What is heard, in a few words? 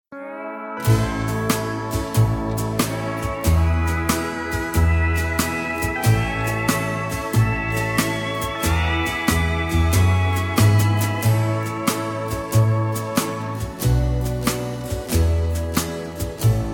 (A) No Backing Vocals